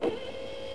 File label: walk